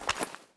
cloth

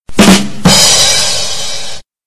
badum

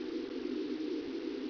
Weird Ambience

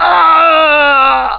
augh

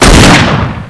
shoot; doraemon; ragingbull

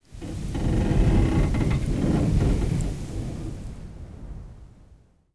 wind metal gust

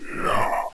pyro pain

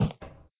step

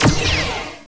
lightsaber fire plasma

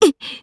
bite

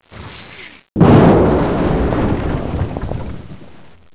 boom

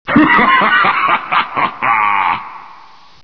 evil laugh

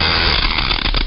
attack; tripodhopper

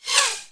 close

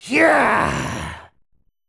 kid death; buu

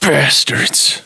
die

